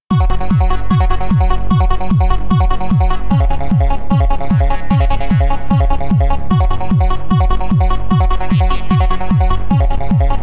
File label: jingle; congress radio